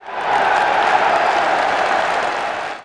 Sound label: CHEER